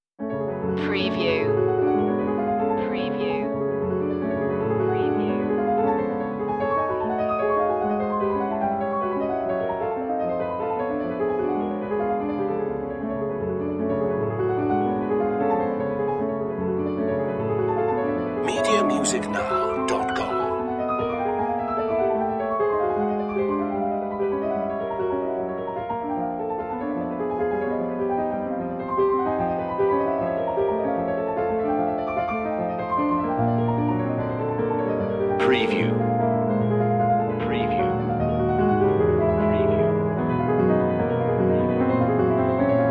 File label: chopin prelude f sharp minor molto agitato; op28